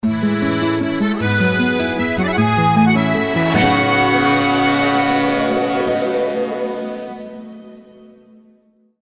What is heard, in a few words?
Level Intro Jingle; The Arctic Cruise